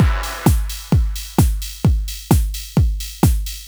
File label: Reaktor Beat, Drumloops; Dr. Fink's Funk Factory